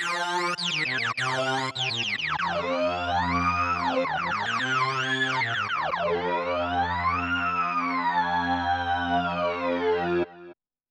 lead synchromod; Clavia Nord Wave

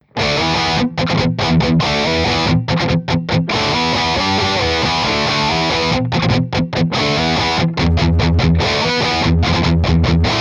metal

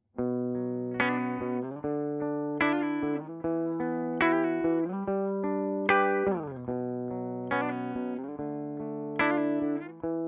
clean bridge arpège gibson; vintage

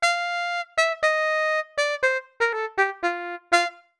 circus, brass; arturia

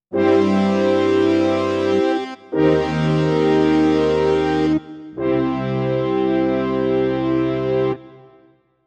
M50 son f horns; korg

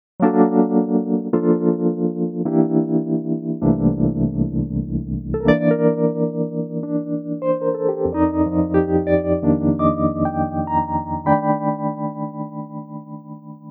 wurly trem; u-he uhbik

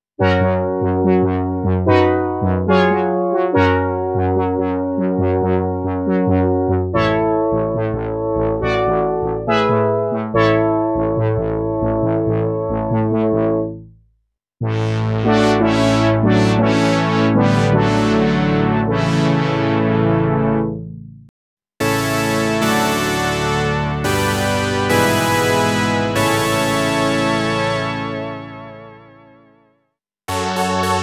classic synths; akai mpc5000

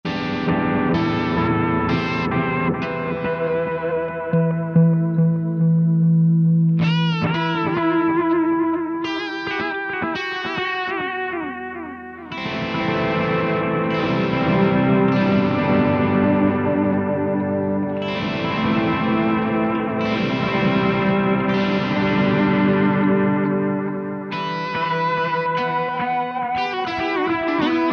analog strat blues driver pod; line6; tonecore; Echopark

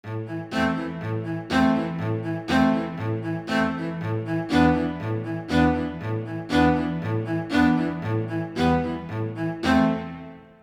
cello stage; eastwest qlso

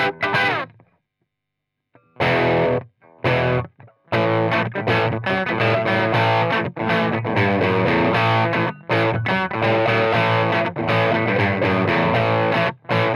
clean don ft twice boosted; brunetti pirata 141

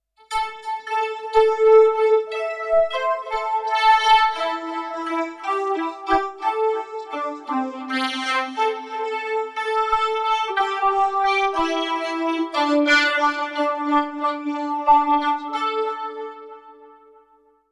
vocodizer; plugiator

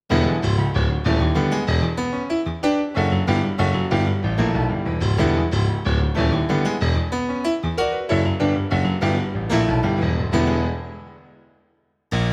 sound piano jazzrock; yamaha tyros3

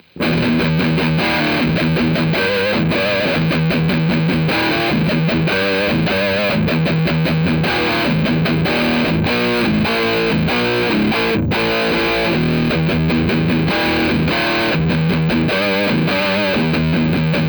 hard rock neck; guitare; indie totalnatural

